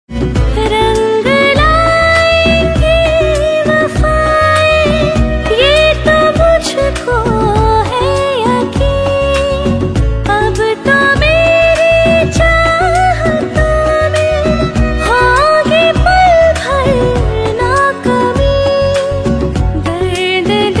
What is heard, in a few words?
Female Version